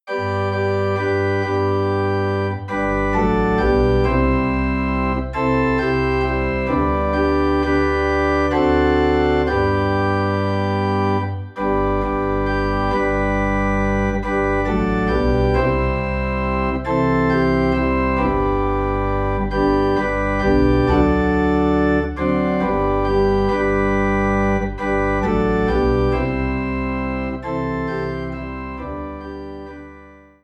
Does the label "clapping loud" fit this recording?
No